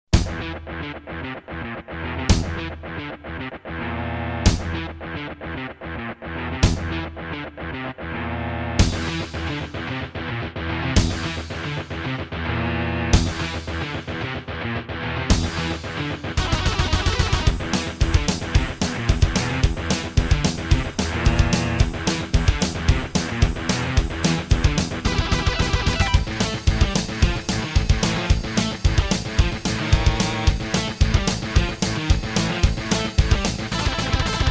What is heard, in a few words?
Akai SG01k; Doom